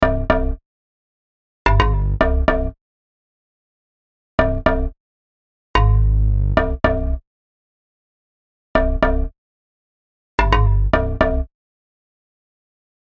sub boom bass

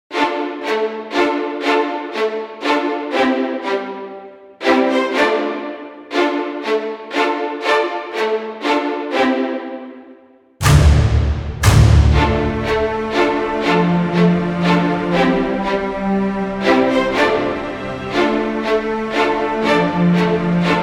Strings; HeadCinema

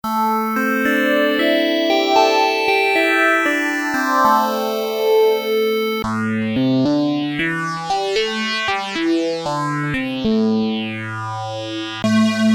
Solo

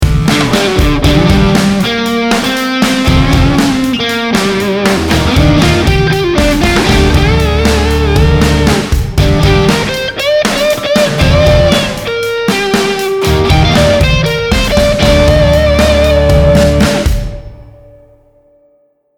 OR120 lead; IK Multimedia AmpliTube Orange; AmpliTube-Software

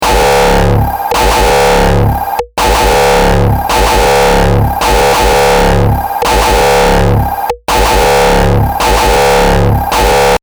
raw kick

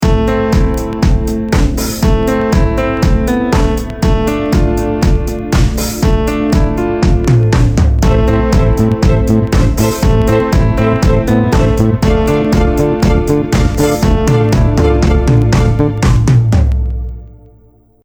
Mix Tube CB W Lim loud

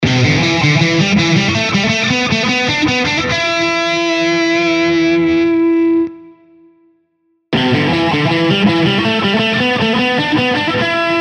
hi gain

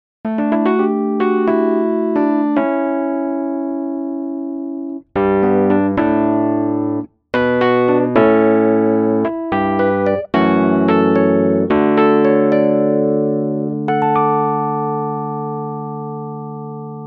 electric studio live crunch

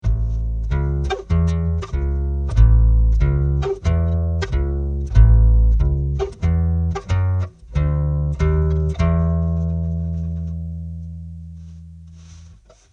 bassmaker

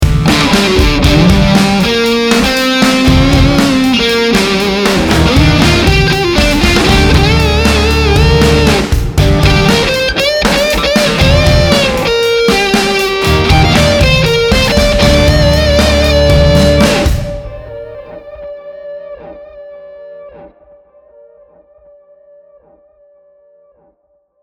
Rockerverb Lead; IK Multimedia AmpliTube Orange; Rockerverb50; AmpliTube-Software

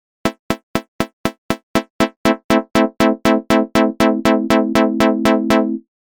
gate time pitch wheel

